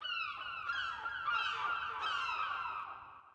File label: Ambient Sound; pangya fantasy golf pc